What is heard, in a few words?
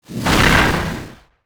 enemy explo stereo; roboraid